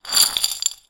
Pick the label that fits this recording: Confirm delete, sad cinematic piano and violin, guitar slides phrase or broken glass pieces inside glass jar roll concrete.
broken glass pieces inside glass jar roll concrete